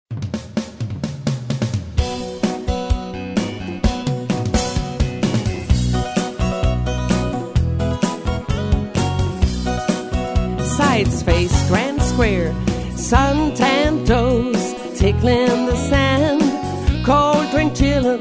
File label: vocal